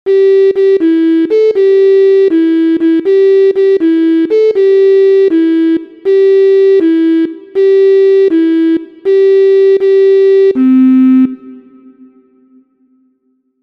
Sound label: MIDI